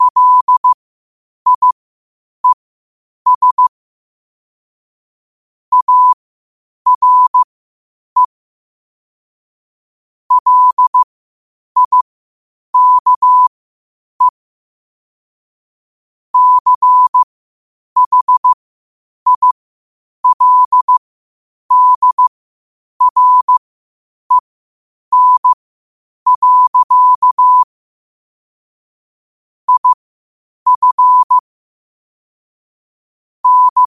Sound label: 5 wpm, morse